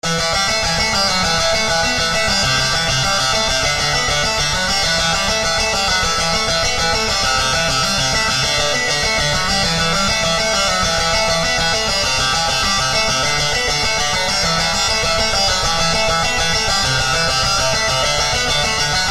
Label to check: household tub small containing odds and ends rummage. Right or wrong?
Wrong